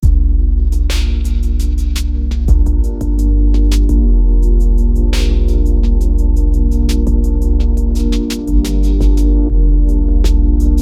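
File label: deep chillhop beat layered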